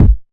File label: dry kick drum A key